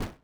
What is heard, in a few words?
step forest